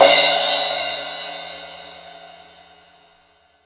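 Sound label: CYM CRS, crash cymbal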